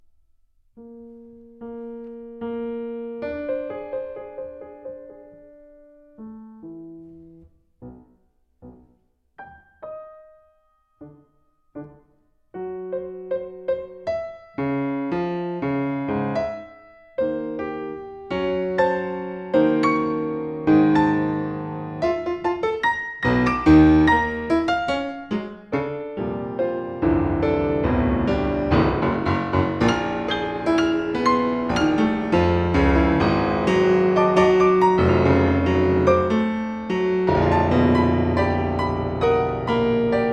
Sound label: Ballad